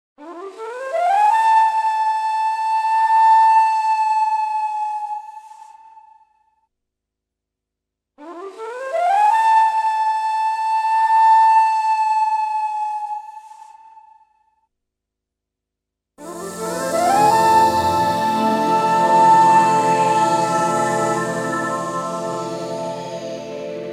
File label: Flute loops; Audiosurf